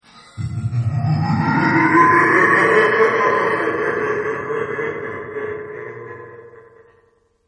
Laugh Evil Very Wet; Evil Laughs; Horror; Laugh Evil Dry

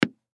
Tennis Ball Bounce, Tennis Ball Bounce Hard; Sports

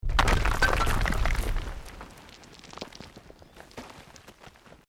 ROCK DIRT SLIDE SMALL

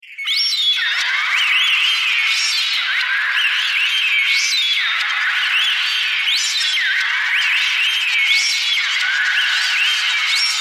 Somebody Broke My Robot, robot sound effect; Robot Sound Effects; science fiction sound effect

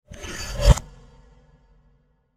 Spinning Metal Transition; Scary Soundscapes; Horror; Crafted Signals

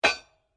SLEDGEHAMMER METAL IMPACT HEAVY

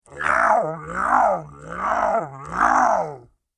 Monster With The Munchies; Monster Sound Effects

